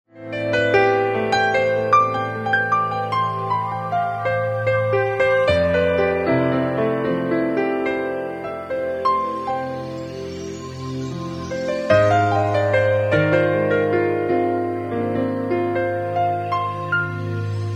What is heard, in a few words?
piano